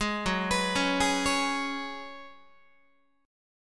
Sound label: Gb Suspended 2nd Suspended 4th, Guitar; Gbsus2sus4 arpeggiated